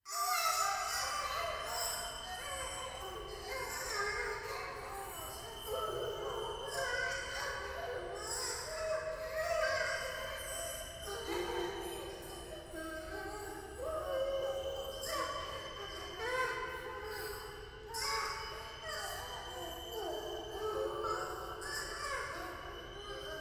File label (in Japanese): 風呂 シャブ喘ぎ 山奥, ASMR